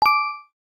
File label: doremi 9